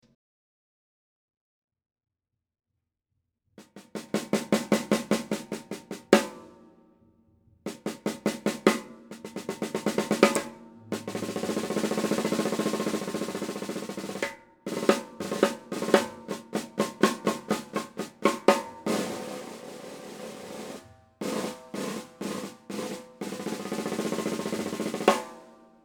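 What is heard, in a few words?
Craviotto Un 6.5 light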